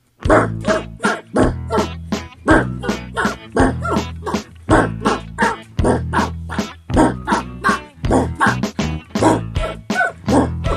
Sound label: dog oompah